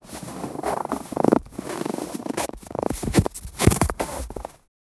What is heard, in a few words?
a two cats running in, snowball